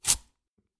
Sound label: Cutting Board Vegetable Chop; soundscrate